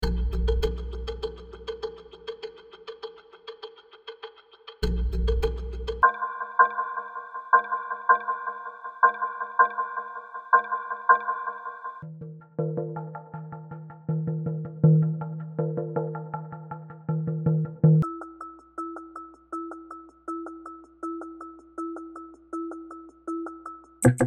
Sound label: Tonal Percussion